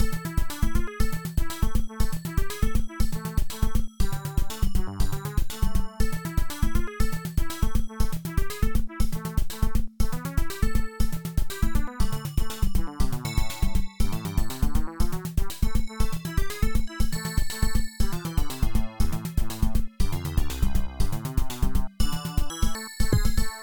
rythm

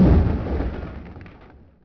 Vehicle Explosion; shadez

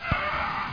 Monster; Blake Stone

